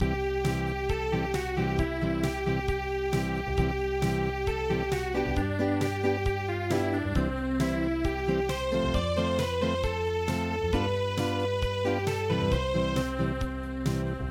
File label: Unplugged, midi; Head Shoulders Knees Toes